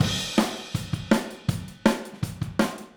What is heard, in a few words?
Overhead ST, Drums, 162 BPM; Take Your Toms Off